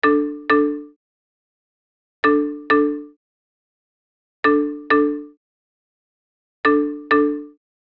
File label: Smartphone ringing modern ringtone loop